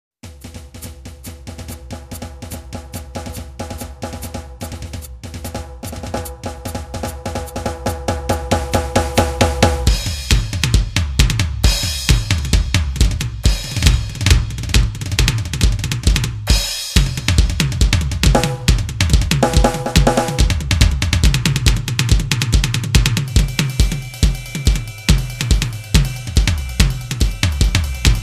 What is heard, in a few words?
solo